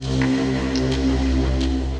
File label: Analog Geiger FM, Disembodied Voices; Multisampled; Instruments; Ableton; Mood Reel